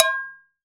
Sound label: Pan Clank, household rhythm samples Hits